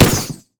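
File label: fireworks missile barrage; Fireworks Corki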